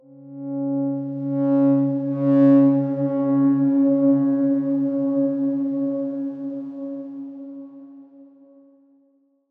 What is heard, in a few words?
Darkswarm C#6 mf; HSS Ableton Pack1